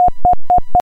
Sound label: freq rect 3step 4hz